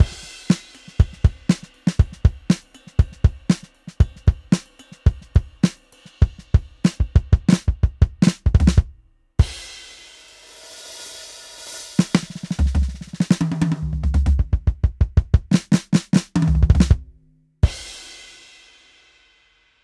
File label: Stereo Mix; EZdrummer 3